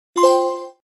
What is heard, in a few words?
ps5 trophy sounds